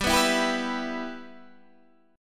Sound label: E Minor G, Guitar